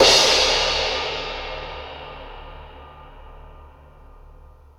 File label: Big Crash One-Shot, cymbal; KJ Sawka; Drum n Bass & Beyond; Drum Tool Box